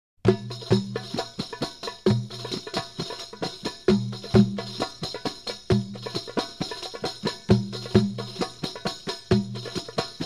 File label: Masmoudi (Full Percussion), Egyptian Dance Rhythms